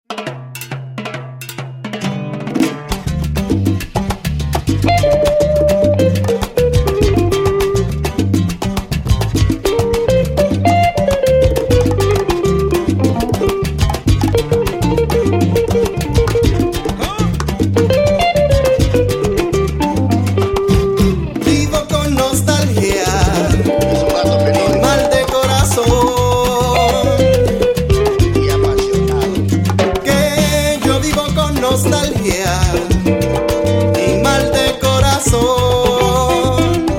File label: gato latino blues